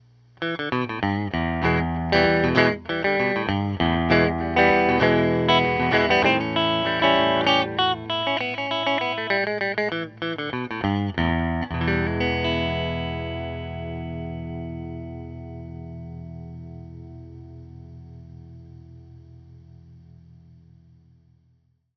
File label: EL GUITAR TUBE